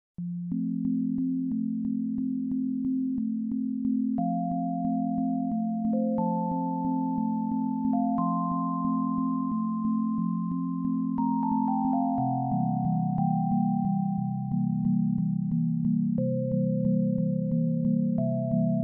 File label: piano bell; mozart piano konzert21